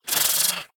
Spider Aggro; The Inn

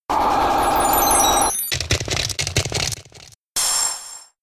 Frost Breath; Pokemon; Gen 5; Blk Wht Blk2 Wht2

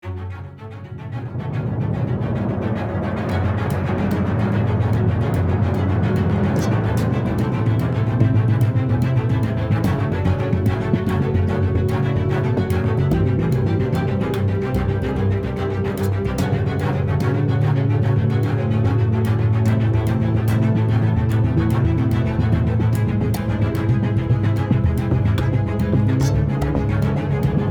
Action loop

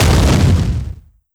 Explosion

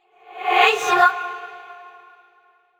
VO cheering; yamiko

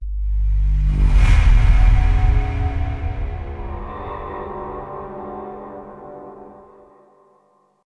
Target Lost; modern combat 5 blackout; android

